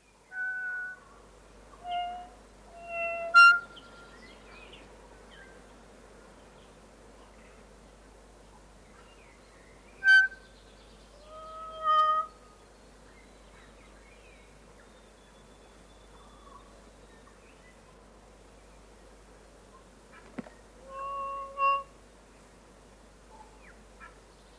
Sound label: kokako song; conservation, native animals, birds